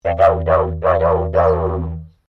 Didgeridoo Traditional Tapered Vocalisation, Traditional Australian Aborigine; Traditional Australian Aborigine didgeridoo designs; Miscellaneous Musical